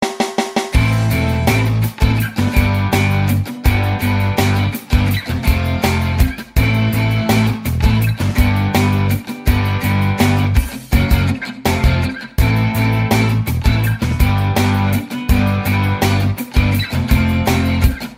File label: Background Music